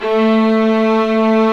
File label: STR, Vlas Sect, Agitato